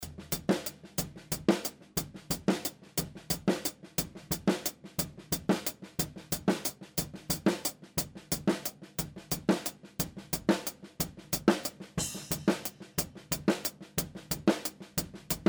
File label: HiHat